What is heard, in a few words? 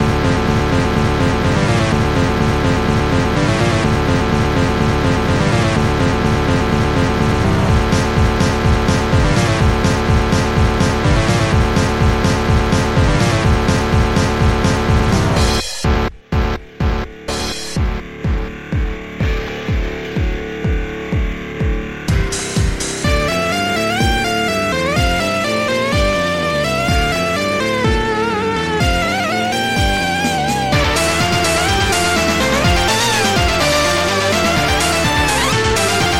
rock burst 4 channels protracker amiga 8 bit; revelon mods vol ii